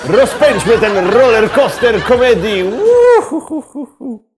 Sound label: rolerkoster; soundboard